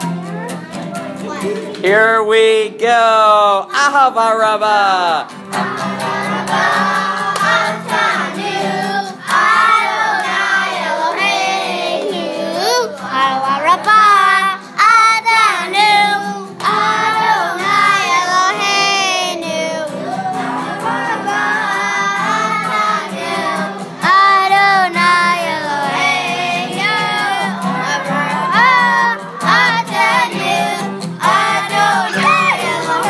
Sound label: Little Kids Sing, Ahava Rabbah